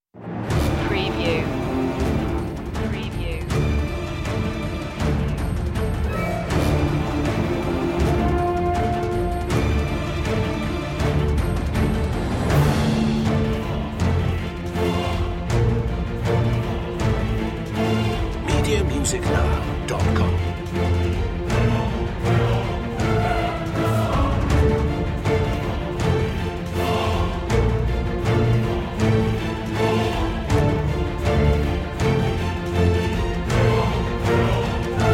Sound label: full mix choir and solo vocal